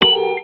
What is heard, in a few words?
machine tinq